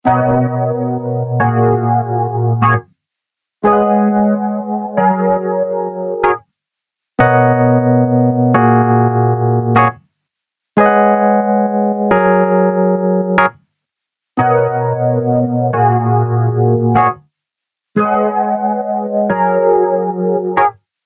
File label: instant flanger mk ii